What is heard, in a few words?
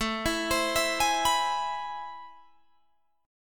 A Minor Major 9th, down, Guitar, Drop D